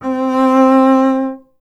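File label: string contrabass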